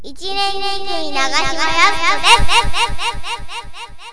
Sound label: echo